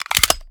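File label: pistol reload mags large mag in; gmod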